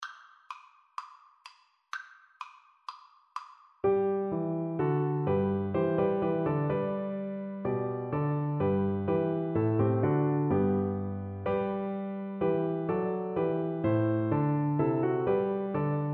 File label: upgood, Viola